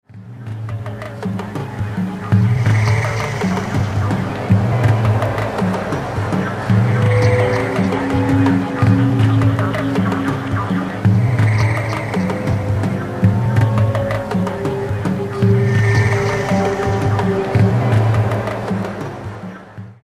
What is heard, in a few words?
beat